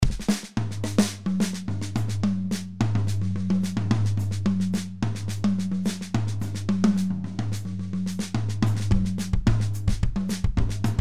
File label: Drums Studio Prog; Addictive